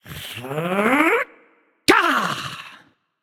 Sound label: battle cry; smite; voicepack; ganesha